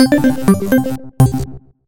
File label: gameish logo; soundscrate